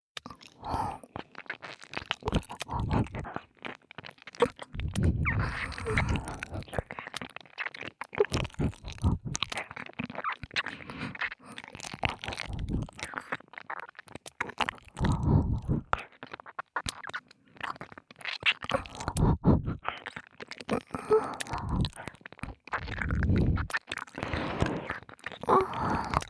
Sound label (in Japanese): (左)ねっとりしゃぶりつき耳舐めエッチ, 黒耳 パンパン系効果音版; 舐め音比べ